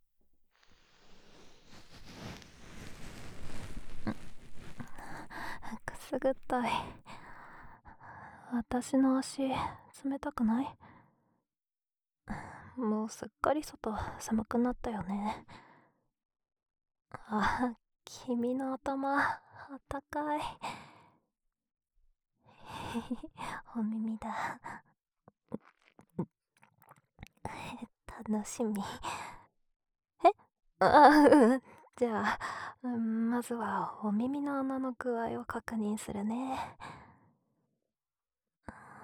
左耳かきパート【環境音なし】; 環境音なしver.元右

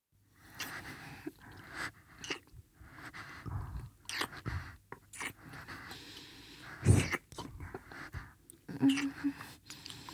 乳首舐めループ音～ちゅぱちゅぱ速め

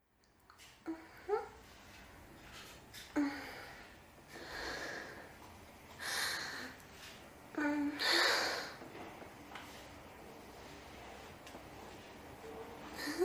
マンコをマッサージされた時の人妻の喘ぎ声; マンコをマッサージされた時の人妻の喘ぎ声がこちら

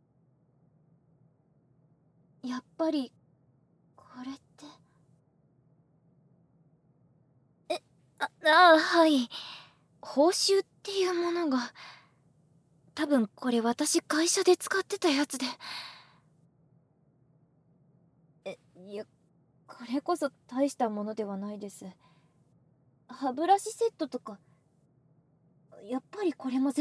しゃこしゃこ歯磨き／両耳かき／洗浄ベロチュー／両耳なめ, ASMR; 「顔を綺麗にしろ」

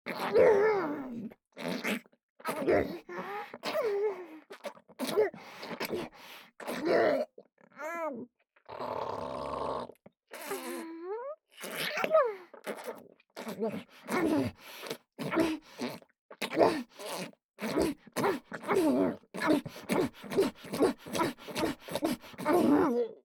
無垢 喉奥フェラ; フェラ素材

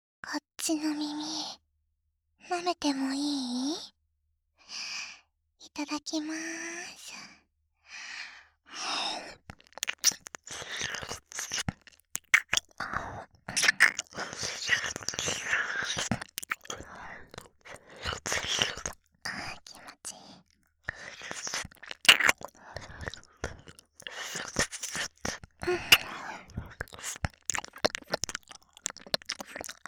耳舐め, ASMR; SEあり

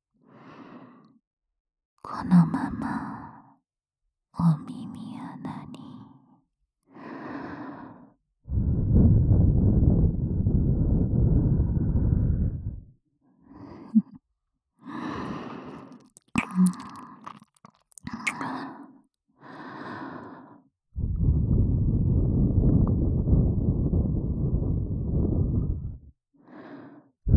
【右】耳ふーと耳キス, ASMR

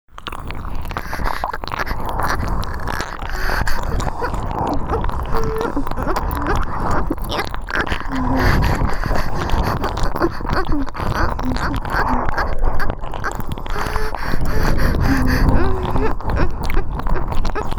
分身3Pで耳奥ドスケベ癒やし舐め＆喘ぎ

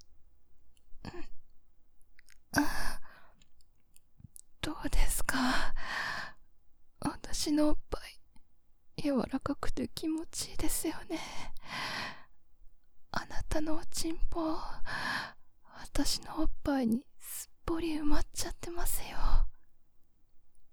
んっ…んッ…～

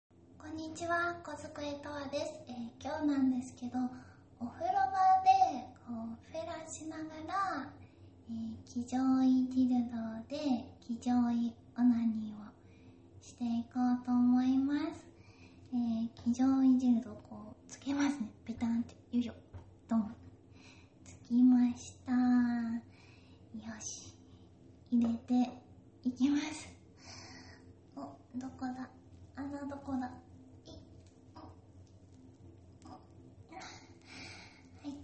【オホ声】お風呂場でフェラしながら騎乗位ピストンオナニー

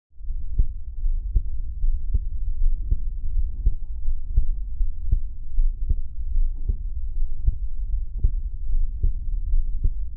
心音と耳舐め, ASMR

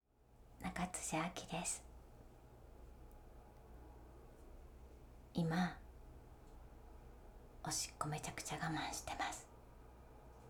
我慢後のおしっこ

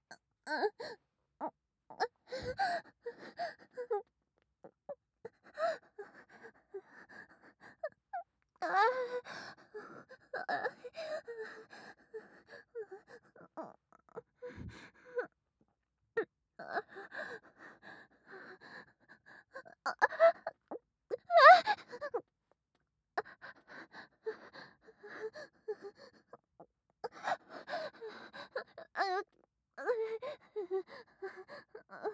クンニ喘ぎ; アドリブ集

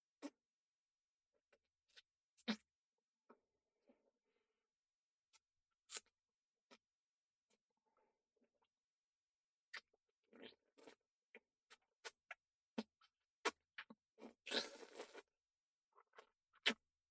フェラチオ, ASMR

